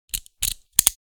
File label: Prisoner handcuffs latch closed